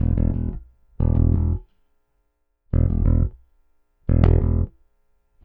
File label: Rock, Bass; Bass X